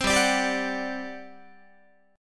G Major 7th Suspended 4th, Guitar; GM7sus4 strummed

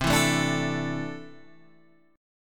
C 6th Add 9th C down Guitar Open G

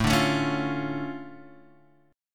D Minor Major 7th, A, guitar

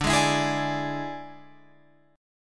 D Suspended 2nd Flat 5th D, Guitar, Open F; 14,15,16,15,17,15; strummed

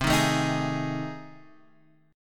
A Minor Major 7th Flat 5th

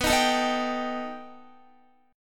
B Augmented 7th B down Guitar; strummed; JGuitar

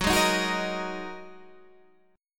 Bb 7th Suspended 4th Sharp 5th Gb

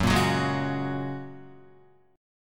Gb Major Add 9th, down, Guitar; strummed; JGuitar

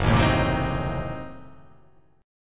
F sharp Diminished 7th F sharp

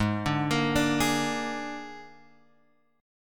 Ab Suspended 2nd Flat 5th Ab Guitar Open G

